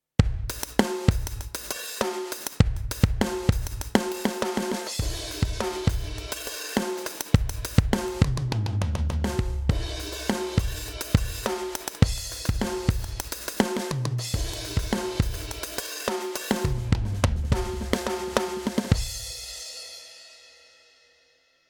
ROLAND TD 02KV Hyper Attack

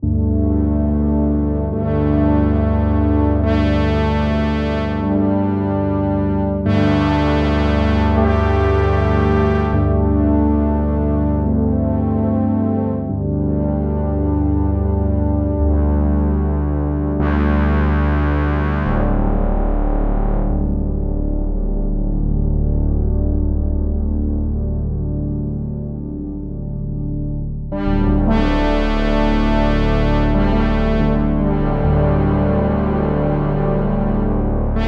Arturia SQ80 V Chroma

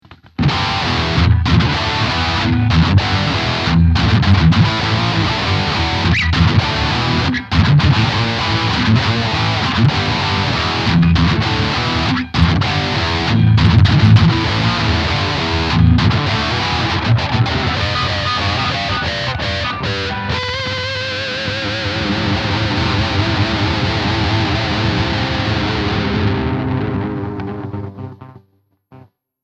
Metal

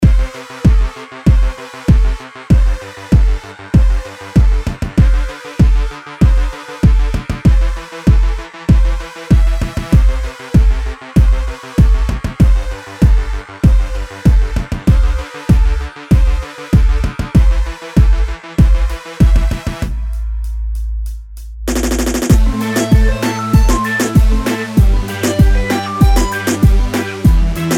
reggaeton

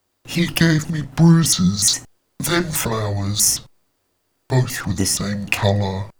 Vox Formant Down

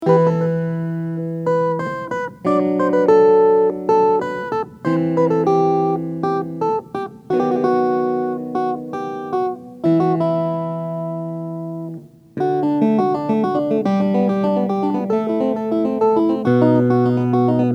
Hohner Clavinet D6 Soft

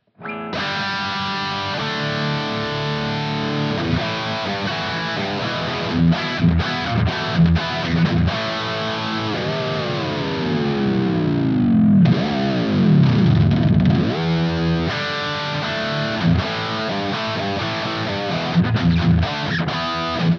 MUSIC MAN L3 lead 1st position chords dive bomb

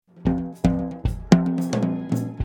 Portico II Depth0 Widthwide EQ L

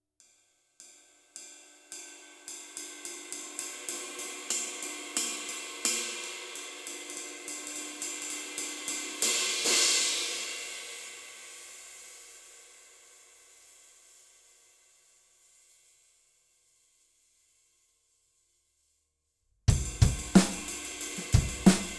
Nuance Ride

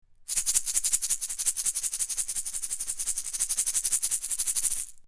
Shaker

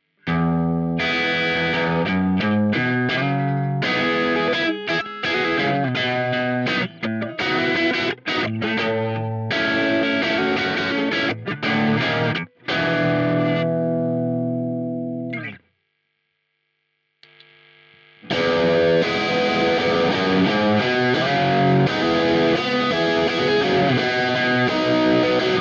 KATANA MARSHALL

